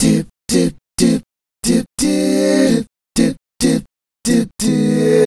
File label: Male Group, Hip-Hop and RnB Vocals